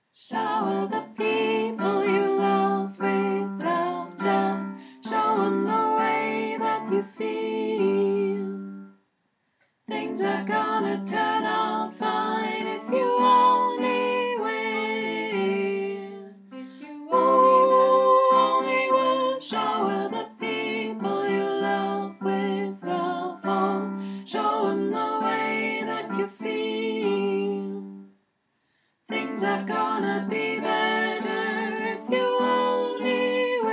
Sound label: Tenor, Singalongs